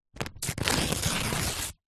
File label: paper rip thin magazine page; foley